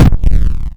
plane die

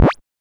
swap

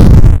explo

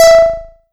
popup

